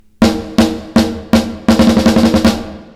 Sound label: Snare tail